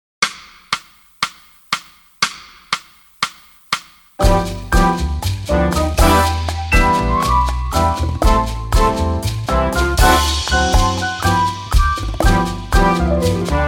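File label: Calypso track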